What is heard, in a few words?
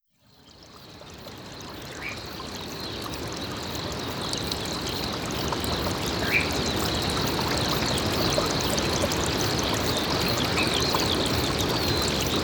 Nature Sounds Sounds from a Grove Spring, Winds and the Blackbird; Nature Chill Out 2014